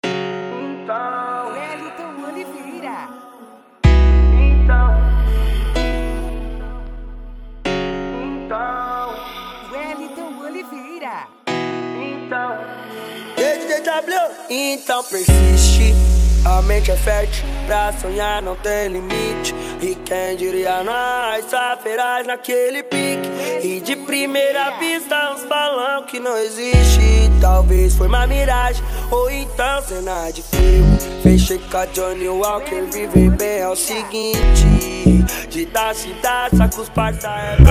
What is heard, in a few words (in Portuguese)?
funk tumdum e mega funk